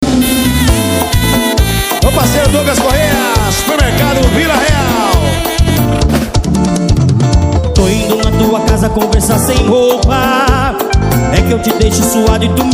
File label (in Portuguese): sertanejo e lambadao